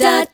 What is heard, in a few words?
Quik B, Vox One Shots